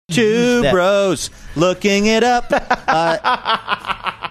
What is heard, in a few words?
singing two bros looking it up